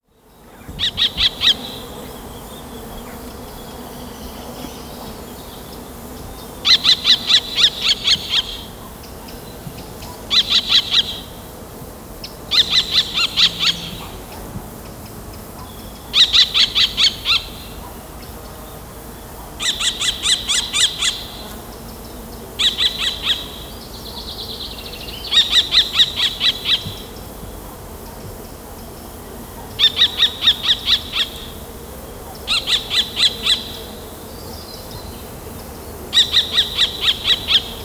Kestrel, birdsong

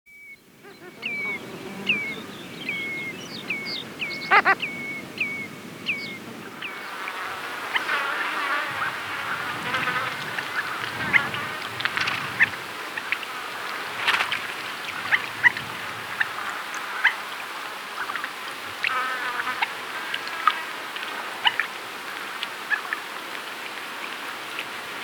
Ruff

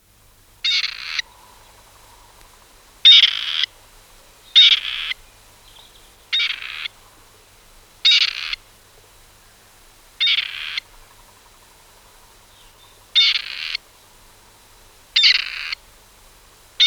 Grey Partridge

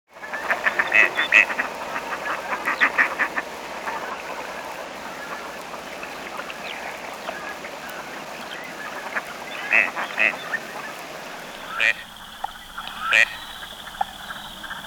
Gadwall

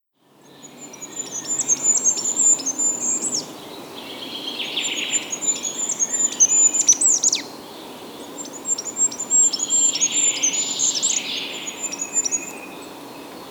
Goldcrest

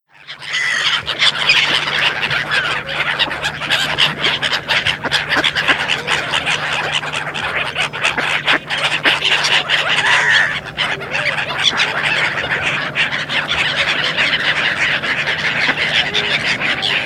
Black-Headed Gull, birdsong

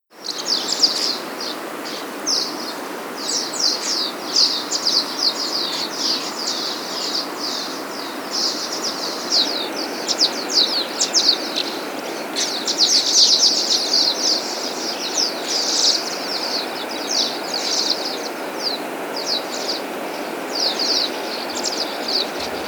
House Martin, birdsong

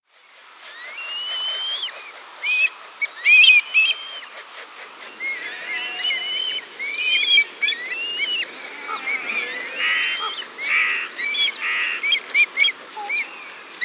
Red Kite, birdsong

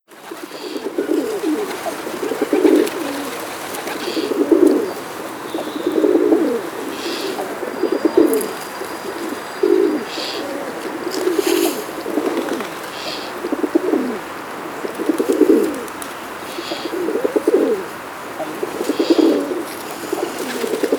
Feral Pigeon

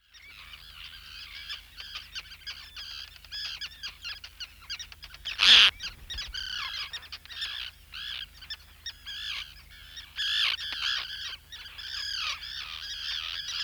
Roseate Tern, birdsong

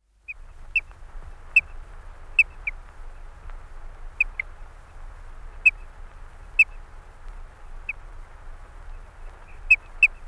Dotterel

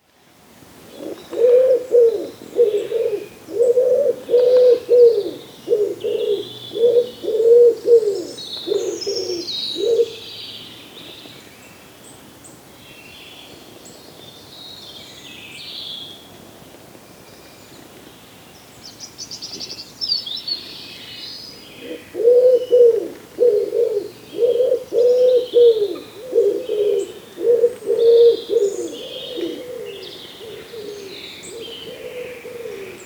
Woodpigeon, birdsong

